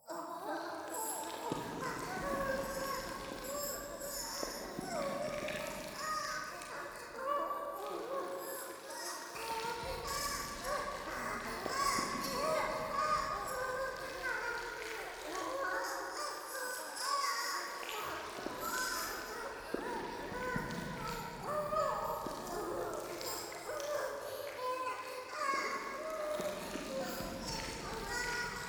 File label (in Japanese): 低音喘ぎ 触手